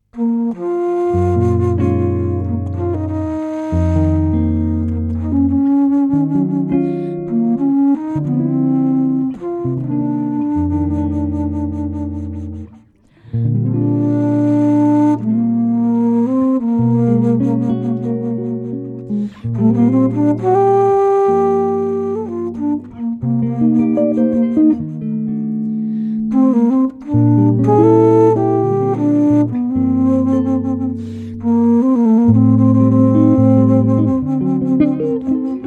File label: JAZZ À LA FLUTE